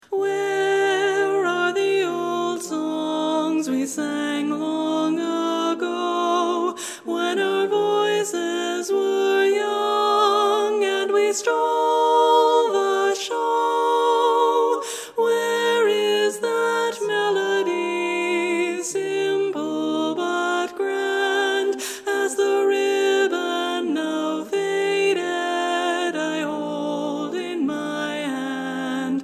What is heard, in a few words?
Old Song Lead